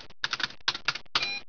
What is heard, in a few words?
pc keyboard